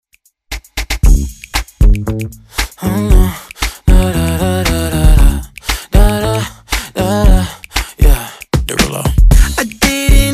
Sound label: Top40 Redrum Intro